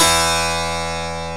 HARPSICHORD

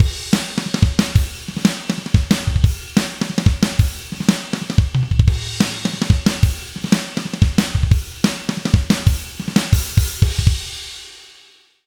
drum, percussion and foley

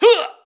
Uaahh; Roland BK-5

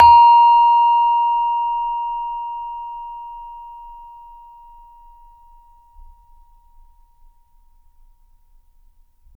SARON A#3; Spectrasonics; Heart of Asia

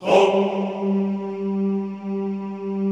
HOMMM G2, Classical Choir; Best Service

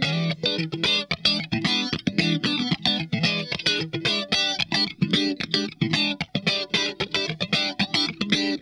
SQUASH, Funk Guitar; 90 s Sample CDs; Zero G

